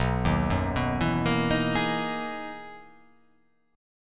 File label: B Major 13th B down